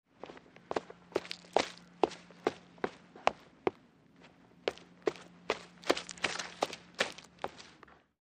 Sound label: Footsteps On Dirt